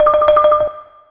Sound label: XILO, PERC LOOP; USB Soundscan vol.60; AKAI